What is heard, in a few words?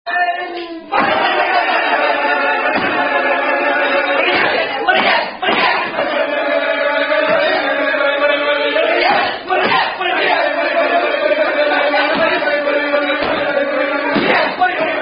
theatre group non-drinking game; Bunny Bunny; Unknown Theatre Group Bunny Non-Drinking Game